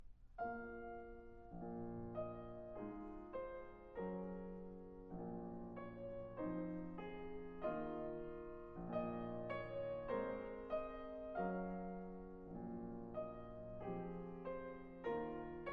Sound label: Sonata For Trumpet & Piano - Mvt. 2. Night Song (Adagio)